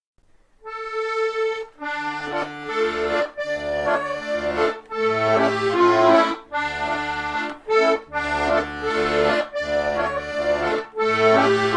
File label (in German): Scherenschleiferlied